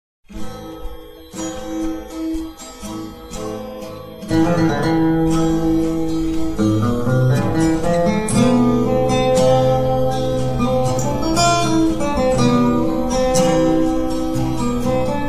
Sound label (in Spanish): Instrumental